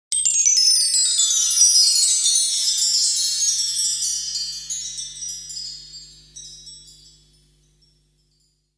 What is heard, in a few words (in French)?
AMBIANCE; pack noel; SOUND-DESIGNS